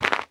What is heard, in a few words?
Cave Footstep; The Inn